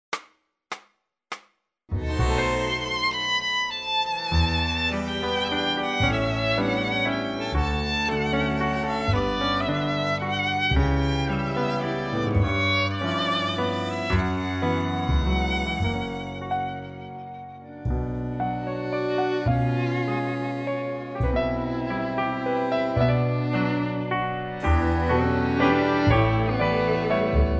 Bm con clic

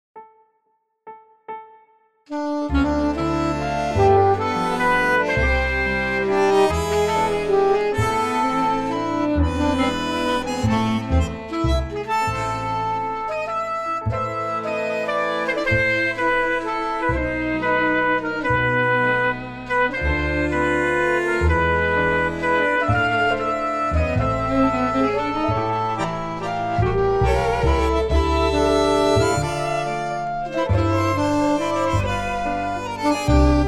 Am SAXO

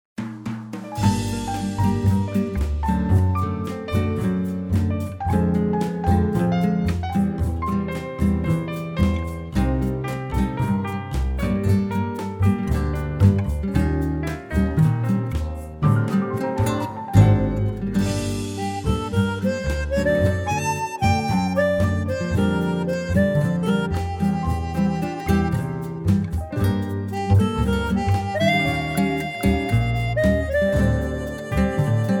armonica

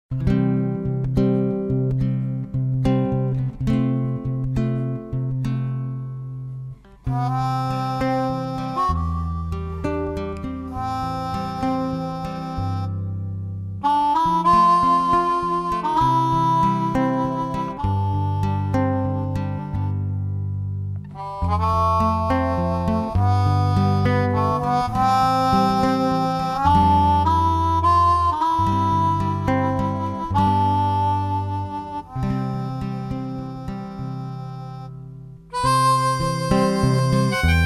C armonica

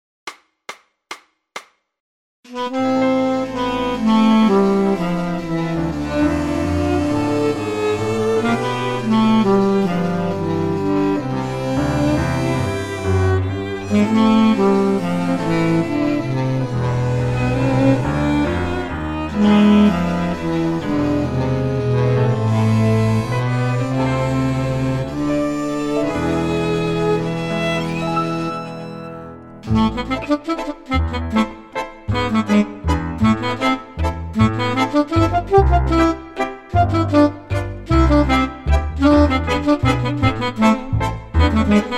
Am saxo

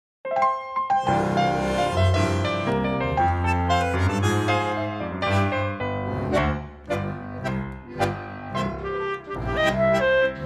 C, clerinete melody

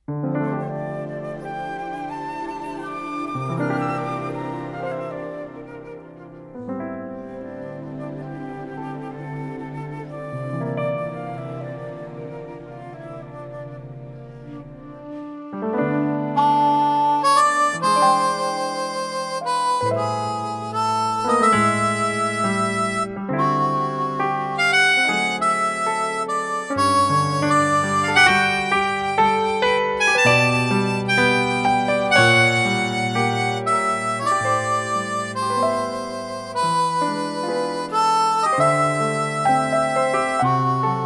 G armonica

